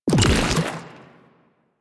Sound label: Dispensary Burst; Warframe; Protea